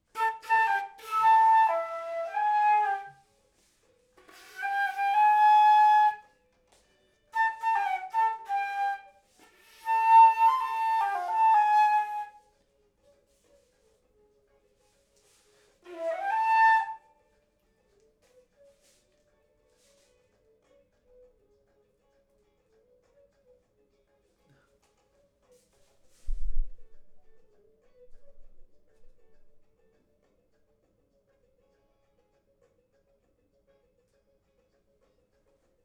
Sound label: flute; logic